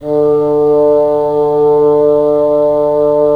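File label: wind bassoon; LA Composer vol. 3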